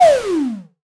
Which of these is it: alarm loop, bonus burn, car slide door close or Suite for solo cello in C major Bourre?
bonus burn